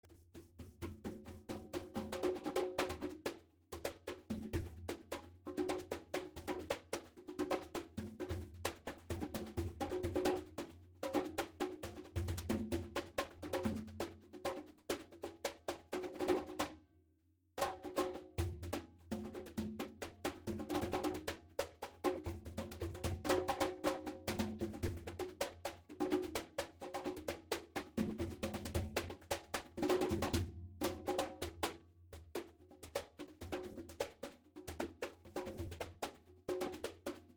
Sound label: CONGAS Residual Noise